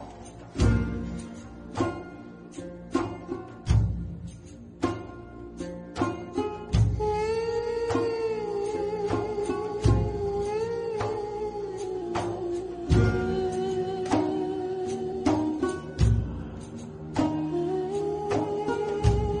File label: islamic bgm theme; hazrat yousuf